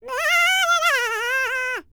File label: BLACK SEA, World Voices; ILIO; Vocal Planet VOL-5